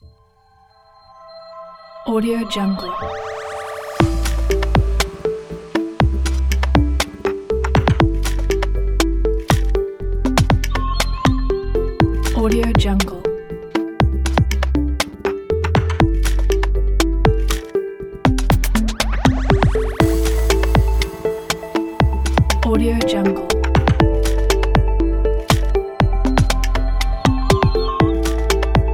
digital technology

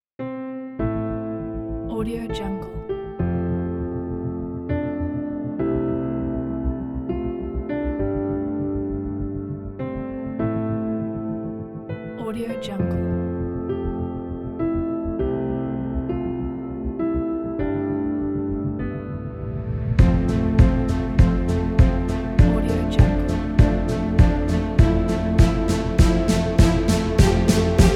heartwarming cinematic indie rock

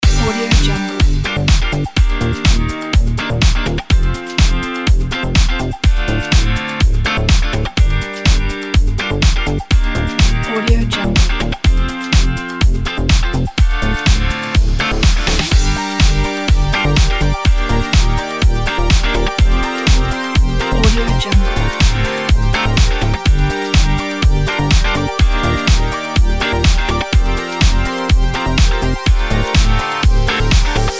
pop background loop

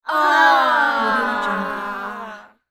children voice "ah"

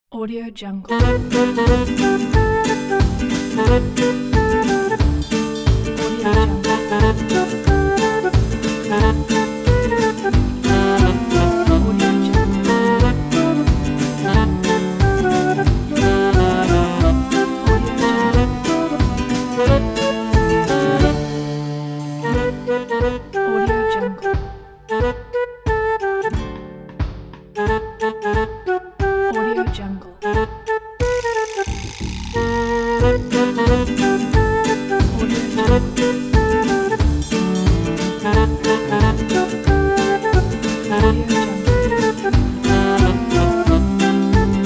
humor of comedy

